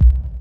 Hard Kick

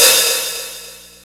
Hat Pedal, Roland D-110; Drum Samples

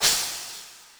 CYMBAL, Yamaha RM 50

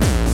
bombo, kicks, Hardcore

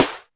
snaredrum, Yamaha RM 50

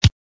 kick

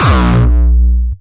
Dark Kick

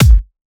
dance BD

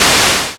Noise, Drum Samples Yamaha RY-30

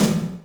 Yamaha RM 50 Toms

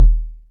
kicks, Bass Drum

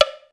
Block, Alesis SR-16, Percussion; Drum Samples

